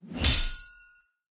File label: Sword Hit; raycaster